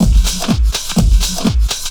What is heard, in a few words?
drm 125, drumloops distortmix; house; PS-28 Vocal House Samples